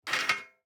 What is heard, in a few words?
DICE on Metal, Grab, Standard, Four Dice; Samples; ArticulatedSounds; DICE Sfx Party (Extended)